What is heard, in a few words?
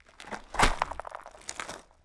Rocky Step, outdoor, Footsteps